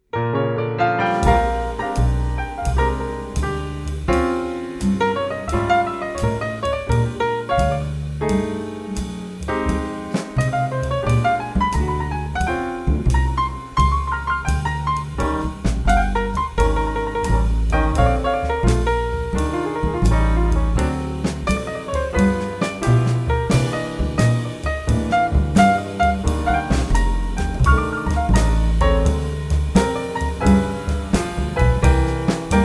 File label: Evil Woman Blues